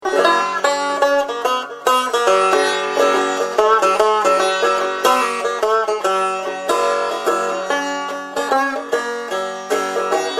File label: banjo; Shelter 5